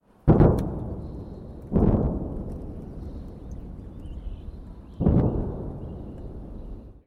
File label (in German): ueberschallknalle